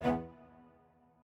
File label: strings